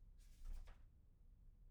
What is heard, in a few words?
pageturn; IEEE AASP